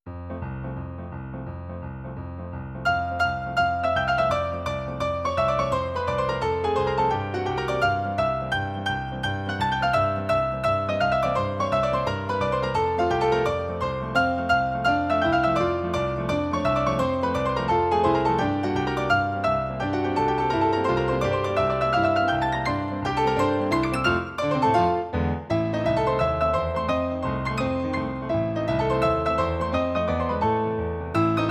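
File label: Scherzo With Rhythm Tarantella, piano; Skertso v ritme tarantelly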